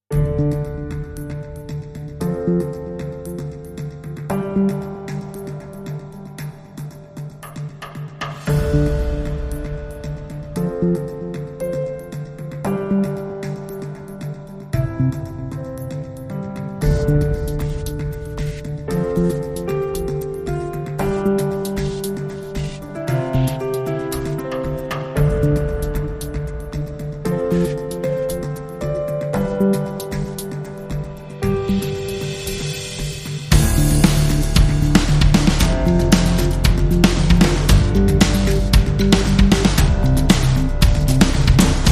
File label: No Theme and Strings